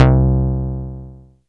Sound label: synth bass, Electronic